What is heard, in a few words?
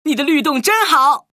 rocktopus sample jazz